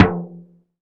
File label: Tom Cheap; Drums